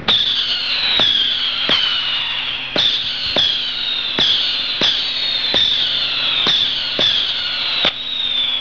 BOELLER; 999wavs

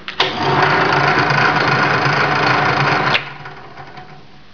Bohr; 999wavs

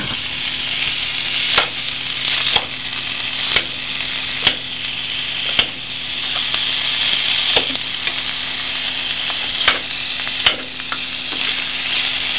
PFANNE

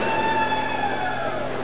PFEIFE

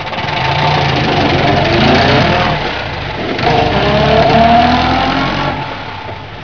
Bagger; 999wavs

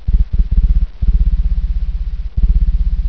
SCHWING

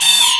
MINEWARN; DEMOUK

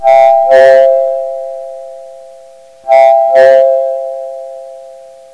TUER GO

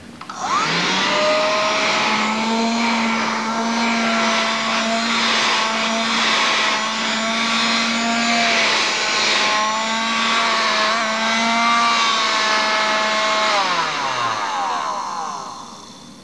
STAUBS

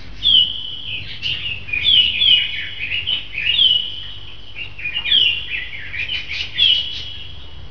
Vogel

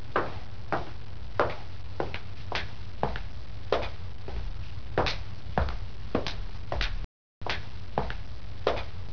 SCHRITTE

